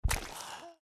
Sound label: feral ghoul foot; ghoulferal